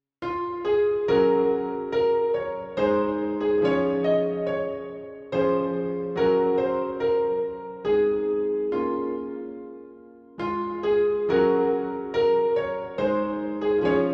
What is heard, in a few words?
Piano; Jupiter